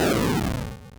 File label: spinrelease